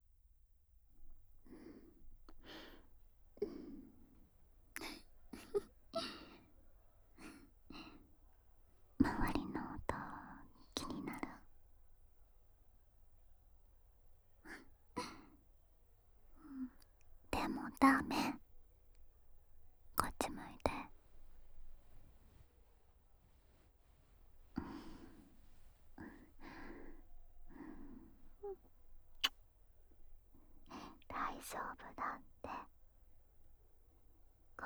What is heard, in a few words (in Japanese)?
階段こそこそ無声音耳舐め＆手コキ; 萌声ひなのちゃんと学園無声音こそこそエッチ